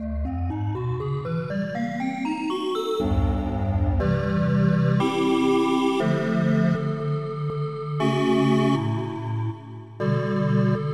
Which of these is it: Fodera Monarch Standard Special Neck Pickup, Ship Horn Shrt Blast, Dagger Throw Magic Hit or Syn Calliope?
Syn Calliope